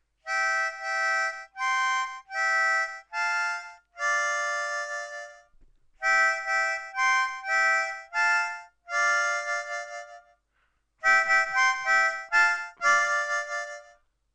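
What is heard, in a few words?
Chorus Fill, harmonica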